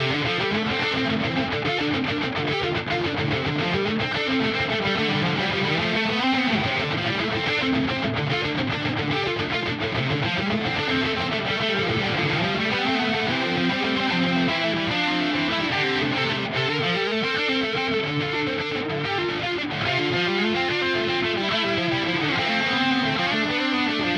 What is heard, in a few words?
Neural DSP Quad Cortex Freeman 100 Rhythm